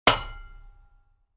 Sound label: mallet hit; project hawk